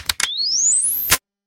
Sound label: reload